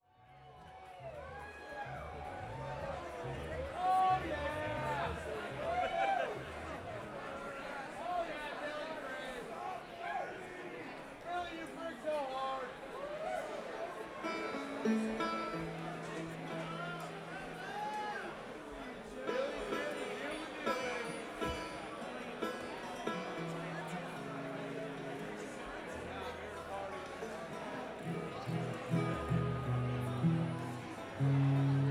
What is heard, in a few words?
walk on & tuning; Bluegrass; fob-mc930